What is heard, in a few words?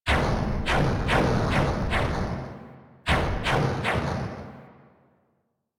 Background Explosions; Warframe; Caliber Chicks minigame; environment